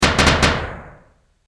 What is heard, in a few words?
Gun Rapid Gunshots